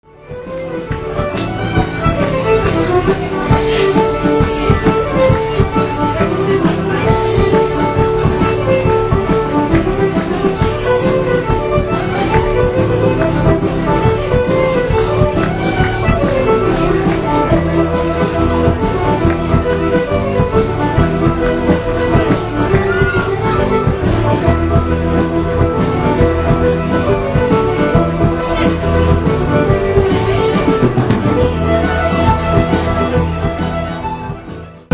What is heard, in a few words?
Jig